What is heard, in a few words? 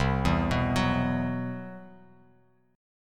C Major 7th Flat 5th, Bass, CM7b5 chord; CM7b5 arpeggiated; JGuitar